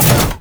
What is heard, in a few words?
rumble shield